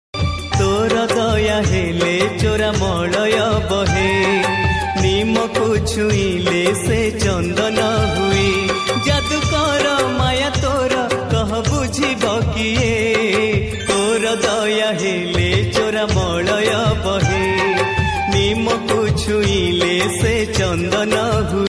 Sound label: devotional ringtone bhakti odia song; jagannath